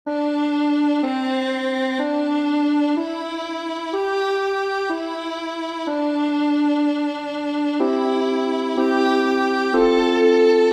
inno nazionale giapponese

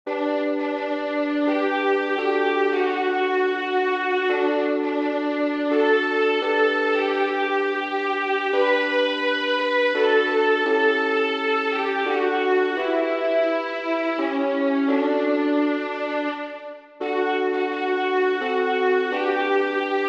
salmo svizzero inno nazionale svizzero; zwyssig alberik

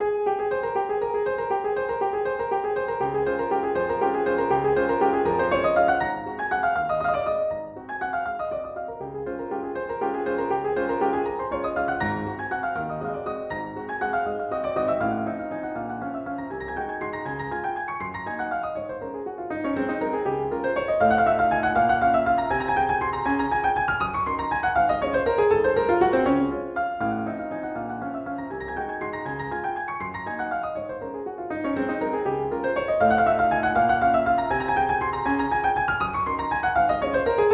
valzer minuto trascrizione concerto per pianoforte; chopin frederic

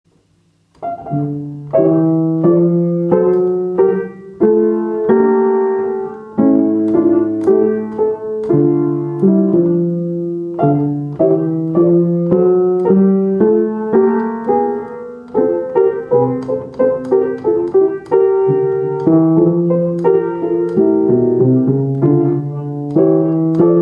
verset per organo; venti versetti

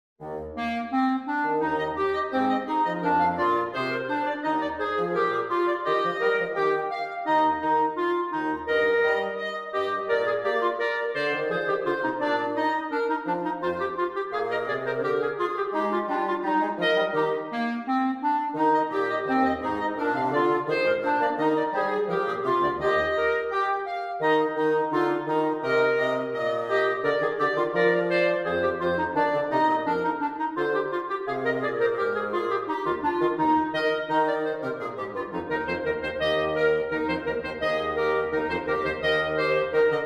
trio vivace per clarinetti fagotto

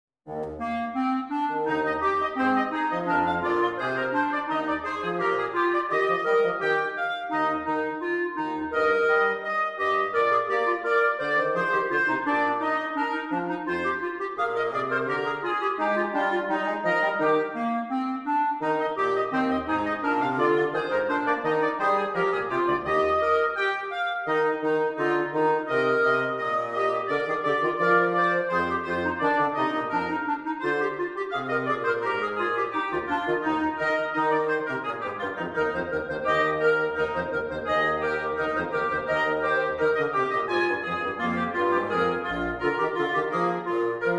trio vivace

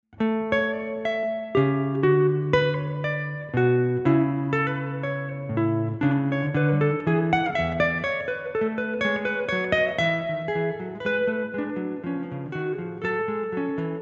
sinfonia

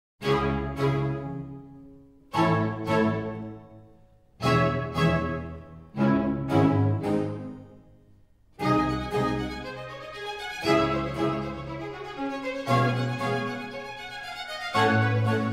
sinfonia accomodata per organo; Alcina

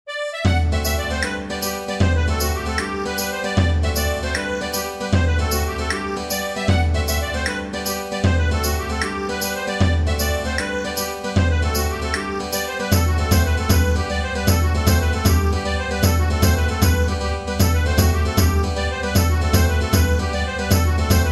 saltarello abruzzese

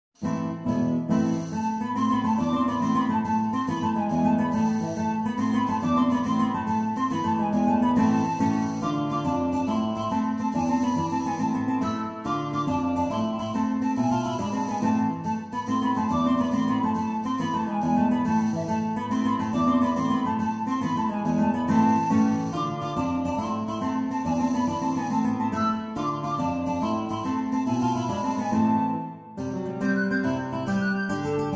tarantella

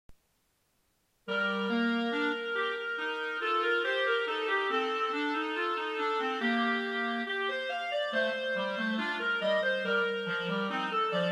duettino

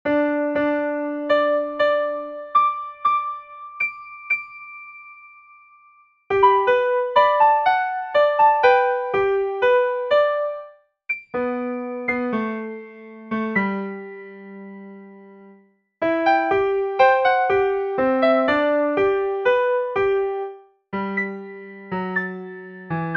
campana zoppa cloche boiteuse; zencovich antonio

